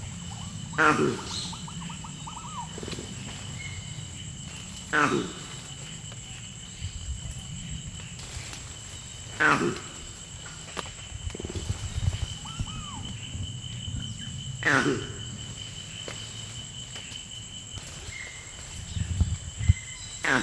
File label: General alert, campbell; vocalisations; compcog